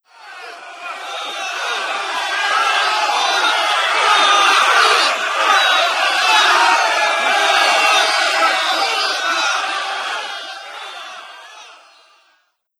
Hollarin

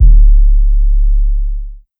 Kick 808

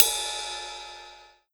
Ride Paiste Long; The Black Pearl V1

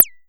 Bird Chirp

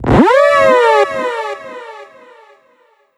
Siren HiTech

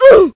Vocal "Uhhh", exclamation

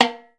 Perc Timbal

